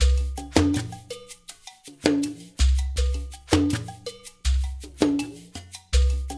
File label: percussion loop